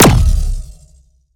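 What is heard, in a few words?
Sci weapon Shot Medium Blaster Pulse Zapper; robobeat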